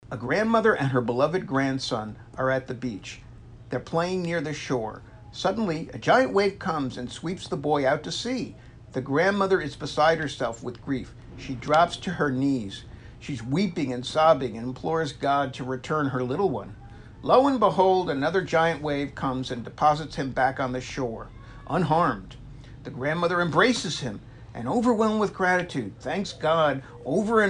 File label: Laugh Track; Roz Chast; Joke